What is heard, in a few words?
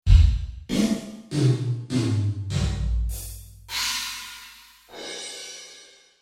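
Drum small ambient; Ambience; Cymbals - Overhead; Mikrofonierung; MusicStore - SoundWorx für Drummer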